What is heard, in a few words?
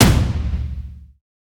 drum; smite; god raijin